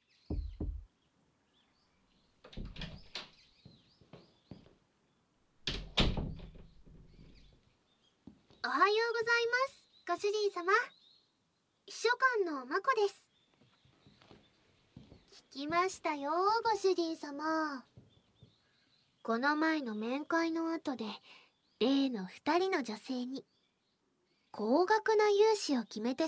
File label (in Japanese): 耳舐めとべろちゅー, ASMR; 結果報告